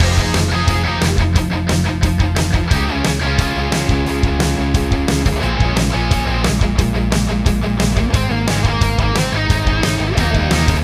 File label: Full Mix